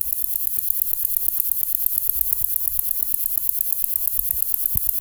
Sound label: conocephalus allardi